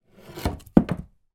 impact wood small slide from leaning upright hit floor